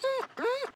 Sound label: whine, wolf; mob; Minecraft; Votive World